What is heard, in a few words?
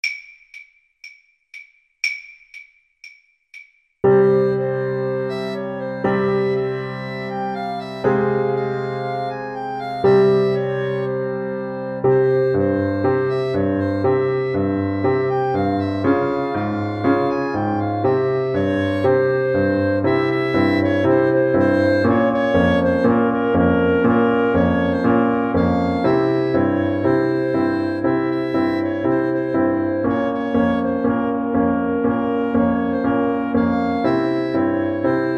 hava nagila, Clarinet-Saxophone Duet